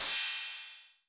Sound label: Cym B 808 Sat Decay A, Cymbal, Multi Saturated